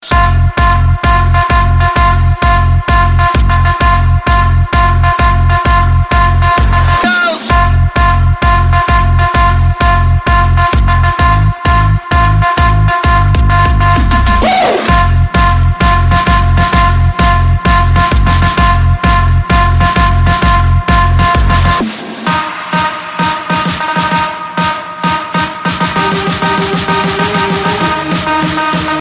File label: techno ringtone; samsung